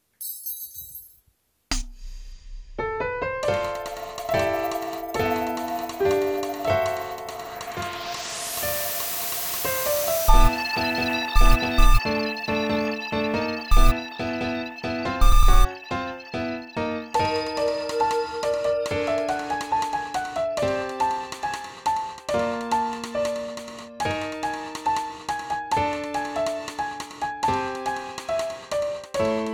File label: Song piano in place of vocals